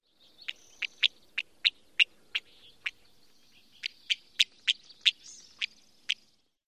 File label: alarm calls near nest